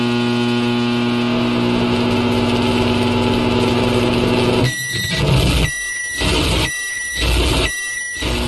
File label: microwave noises